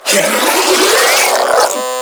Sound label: Proto Infested Ancient Mid Spin Scream; Warframe; Abilities